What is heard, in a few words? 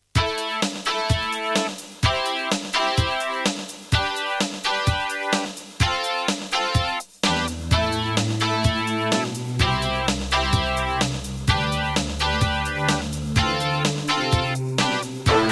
Kawai GMega; raptor call of the shadows